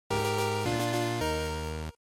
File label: GB Action Clear